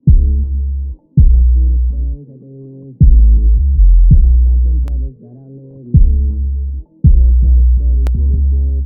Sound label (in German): gefilterter beat; 808; 808 Producing Guide